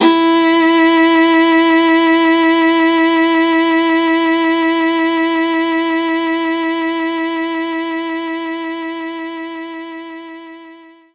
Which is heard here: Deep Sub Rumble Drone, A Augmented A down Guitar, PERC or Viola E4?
Viola E4